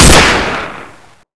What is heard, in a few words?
carbine shoot; day of defeat